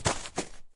Sudden Step

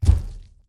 Giant Stomp

